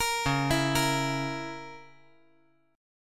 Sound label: Db Minor 6th Db down; Dbm6 arpeggiated; JGuitar